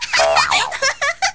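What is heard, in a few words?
rocket; My Animated Word Book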